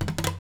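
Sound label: scrape, guitars; Spectrasonics; Hans Zimmer Guitars VOL-1; TAKA FX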